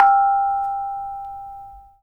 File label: KONGWONGLEK G5; Spectrasonics; Heart of Asia